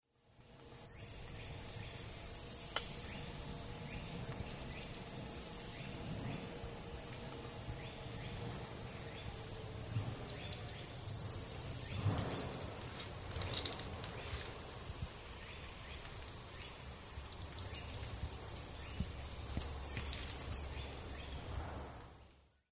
Epinecrophylla fjeldsaai, song; S. America; Eastern Lowlands

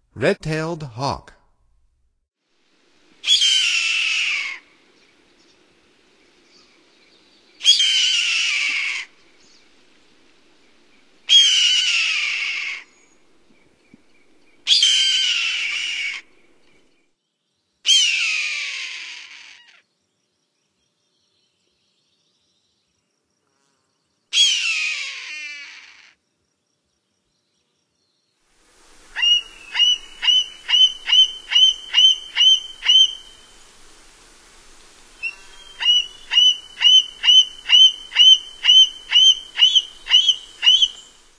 Red-tailed Hawk, Songs of Birds of North America; Stokes

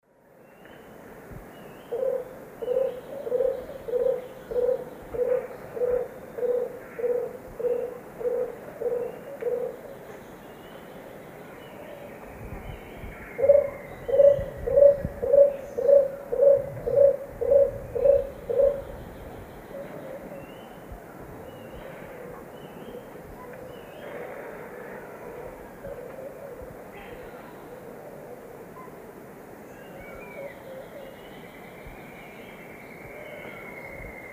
Capito aurovirens, songs 2 birds; S. America; Eastern Lowlands

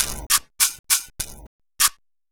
Dill Beat, Electronica Electronic Beats

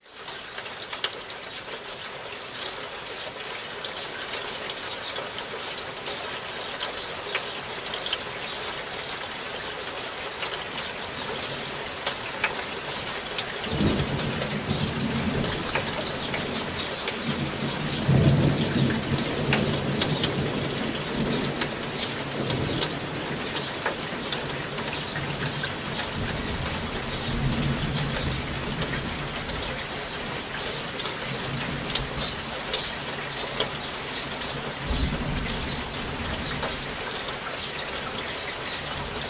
Rain Trickling Sounds

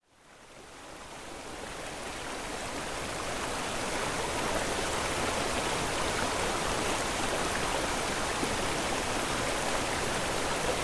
White Noise Meditation - 3 Hz Delta Waterfall; Extended Version

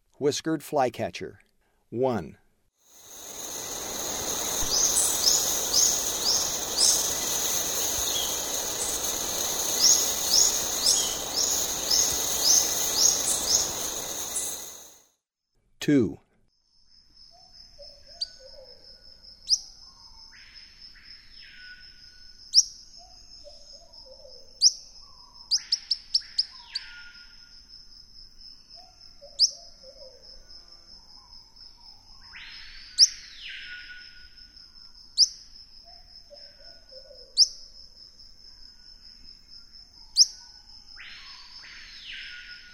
Whiskered Flycatcher; S. America; Eastern Lowlands

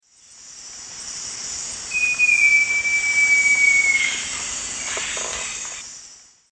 Jacamerops aureus, song; S. America; Eastern Lowlands

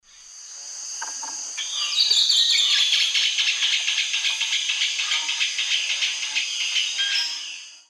Chloroceryle amazona, song; S. America; Eastern Lowlands

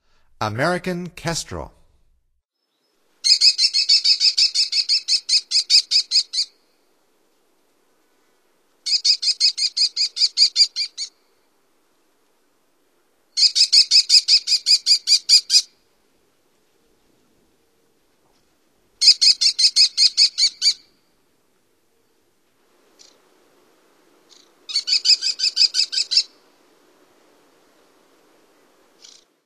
American Kestrel; North America; Stokes

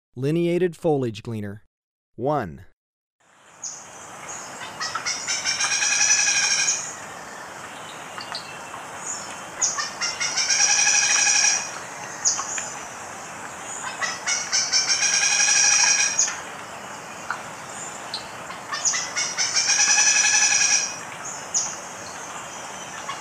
Lineated Foliage-gleaner, Birds of Ecuador; S. America

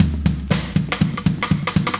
chique 120, loops, 120bpm; Sound Effect Library

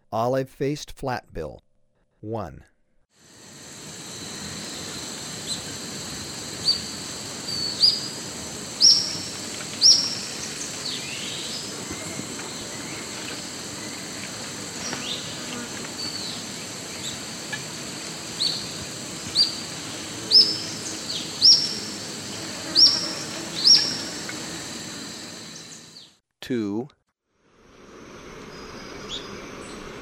Olive-faced Flatbill, Birds of Ecuador; S. America

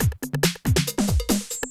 techno 140

